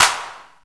clap sound b key; percussion single hits one shots

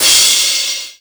long tail reverb crash cymbal c sharp key; Duration 0:01; Bitdepth 24

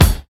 hip hop bass drum c sharp key; kick drum samples

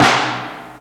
long room reverb snare b key

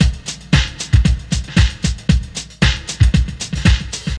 115 bpm 90s drum loop sample b key

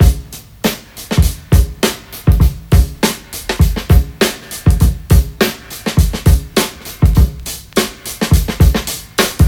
101 bpm breakbeat sample A sharp key

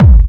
strong bottom end kickdrum sound g sharp key; Duration 0:01

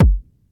00s kickdrum sample g key

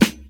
old school funk snare sample g sharp; snare drum samples

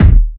dry kick one shot d sharp key; kick drum

